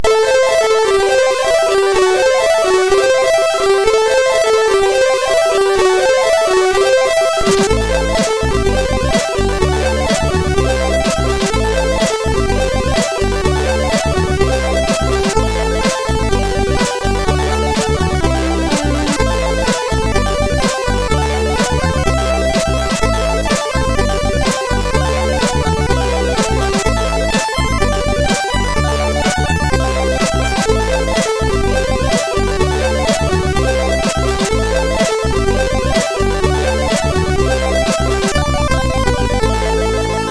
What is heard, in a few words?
sid, MOS6581R4; Vibrations